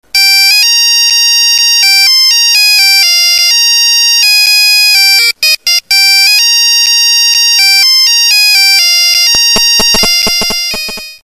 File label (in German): polen hymne; midi; Polyfonisk musik; Hymnen